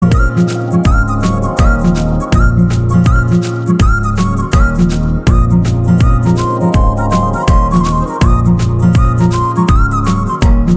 Flute Love Bgm; mr majnu; Telugu

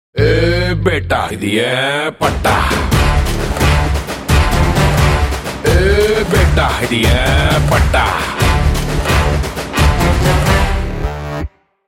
Tamil Promo Bgm Ringtone; Eyy Beta Idhu En Patta; Pushpa The Rise

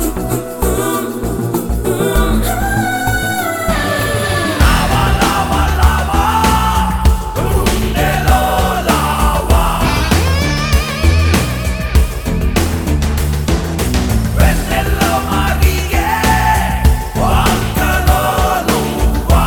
Fast Beat Ringtone; Eega; Telugu

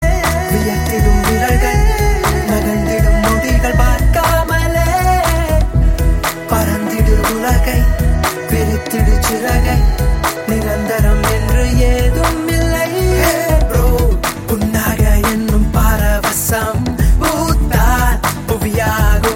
Bgm Ringtone; Lift; Tamil Movie; Hey Bro

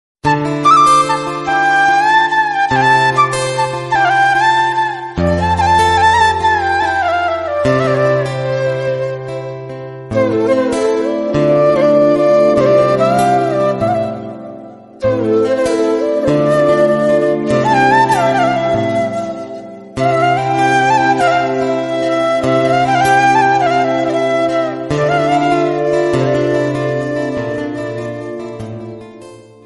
Sad flute song music bgm; Telugu

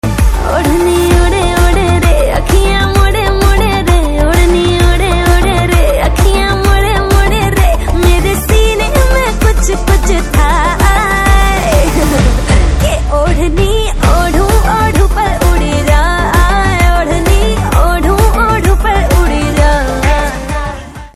Ringtone, Hindi

